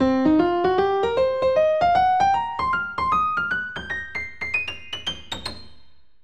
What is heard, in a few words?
piano blues c scale